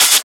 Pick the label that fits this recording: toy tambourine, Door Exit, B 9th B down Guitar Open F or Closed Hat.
Closed Hat